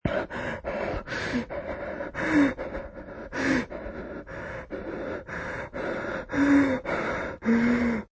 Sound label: Man Panting, Breathing; Man heavy labored breathing Panting, after couple of deep breathes his breath returns to normal; Human